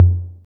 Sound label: Deep TR 808 Synth Tom F Key, Royality free tr 808 synth tom sample tuned to the F note; tom drum samples; one shots